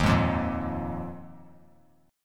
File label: D sharp Suspended 2nd Suspended 4th D sharp Bass Standard; strummed; JGuitar